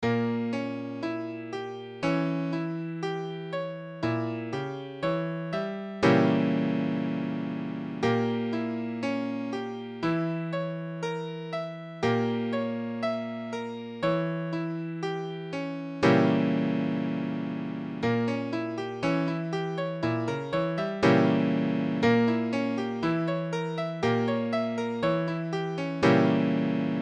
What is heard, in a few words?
Bbdim7, piano